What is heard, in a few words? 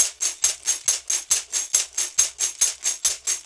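TAMB, tambourine; Drumdrops In Dub VOL-1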